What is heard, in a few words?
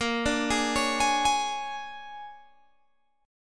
Bb 7th Sharp 9th Bb down Guitar Open G; arpeggiated; Bb7#9